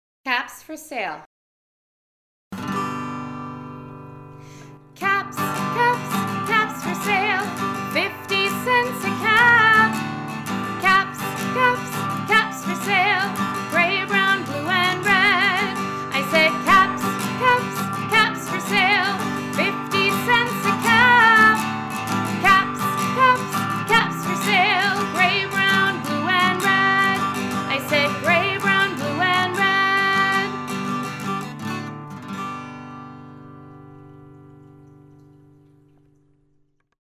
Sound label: music w vocals and guitar